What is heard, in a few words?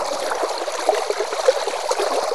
water